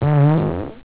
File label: fart